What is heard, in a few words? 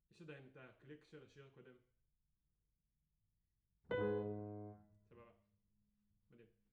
Piano A